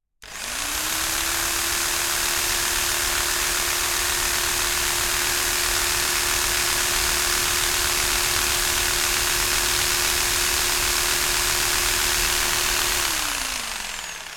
Jig Saw B - Sustain Close; SDC Cardoid Stereo

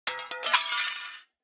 Glass, Plate Glass, Thick, Break, Topple; Schoeps; Airborne Sound; Elements Glass

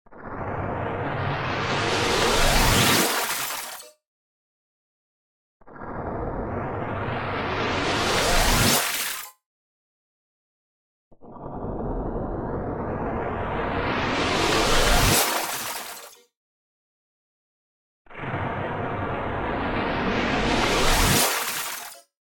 Robot Power On; MatiasMacSD; THE MACHINES 2 ROBOTIC SOUNDS

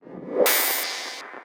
Accent, Glitch, Airy, Coarse, Swell to Sting; Airborne Sound; Manic Glitches

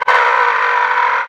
roller mine tossed; hl2

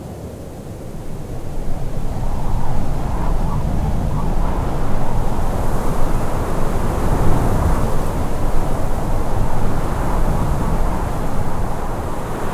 Desert Soundscape Wind Loop; Olivier Girardot; Natural Disasters

